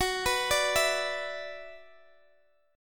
F sharp 7th Suspended 4th Sharp 5th, Guitar, Open G; x,x,16,16,15,14